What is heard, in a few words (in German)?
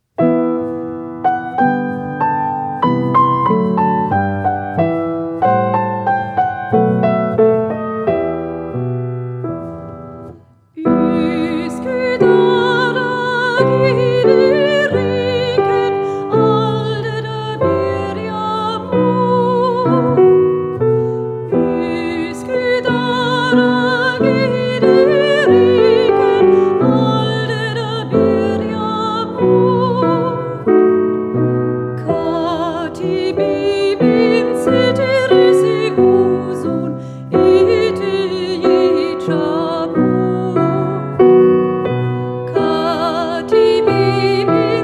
komplett mit Gesang